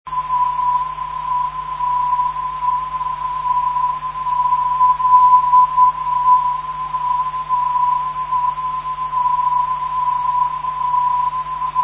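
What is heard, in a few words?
319.02 kHz, non-directional beacon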